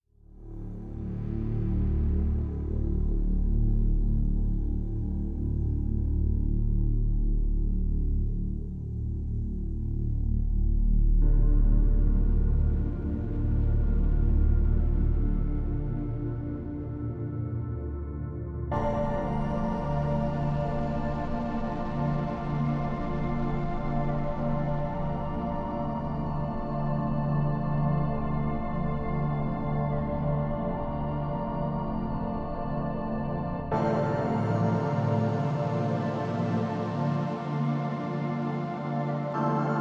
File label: Lift Off Drone Light